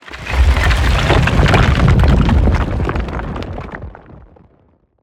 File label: debris, Mothership; BlueZone; District 10 Mothership Sound Effects